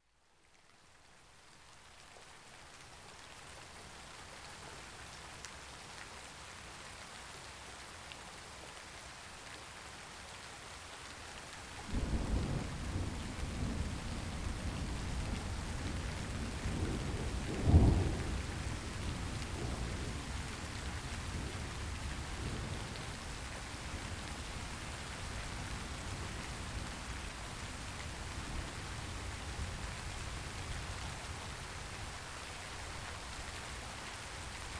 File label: Ambient Rain, sound of nature